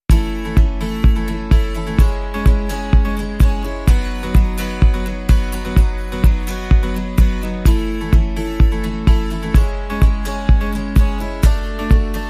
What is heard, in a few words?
Confident Soul